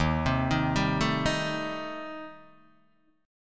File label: Eb 6th Flat 5th Eb, Guitar DADGAD